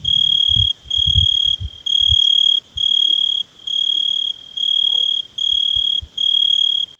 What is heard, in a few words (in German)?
Weinhähnchen